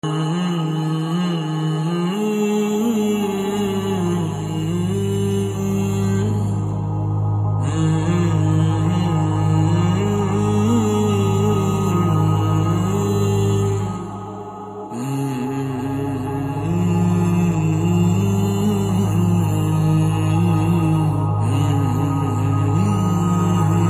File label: BGM Humming